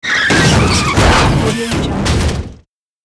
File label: cartoon car brake and crashing